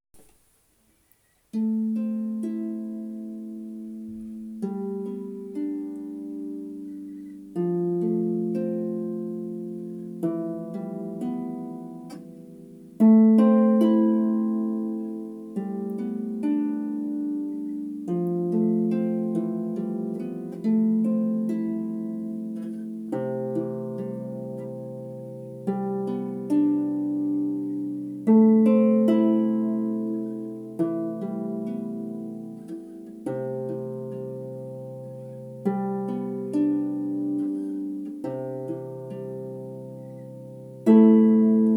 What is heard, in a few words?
Greensleeves Left Hand, harp